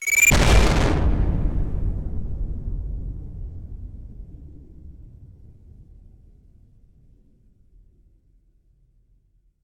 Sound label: Death Screen Transition